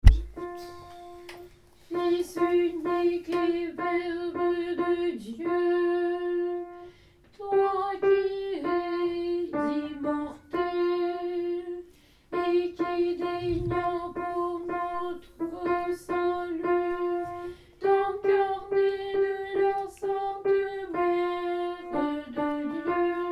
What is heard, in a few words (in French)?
alto